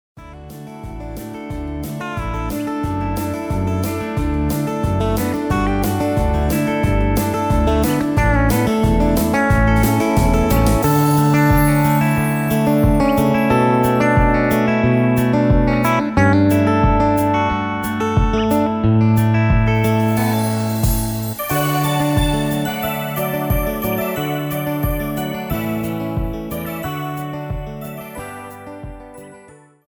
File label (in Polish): wersja instrumentalna